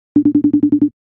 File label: ios18 facetime calling; Системные звуки и уведомления iOS 18 (iPhone 16, 16pro, iPad)